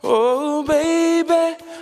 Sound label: "oh baby", male, sung; Voice